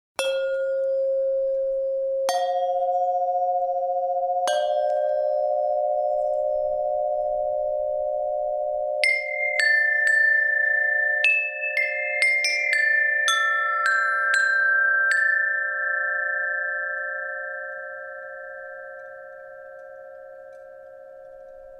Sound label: beating chimes